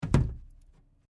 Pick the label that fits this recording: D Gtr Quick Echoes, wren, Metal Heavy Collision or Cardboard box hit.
Cardboard box hit